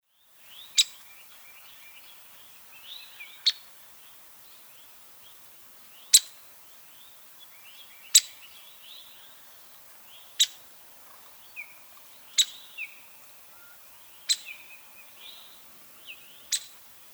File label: downy woodpecker call notes; paul marvin